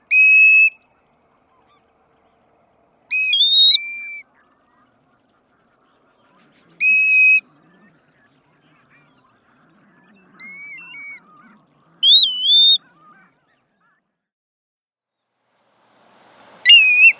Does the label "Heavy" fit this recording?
No